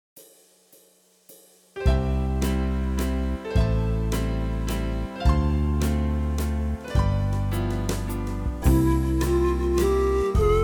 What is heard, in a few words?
keyboard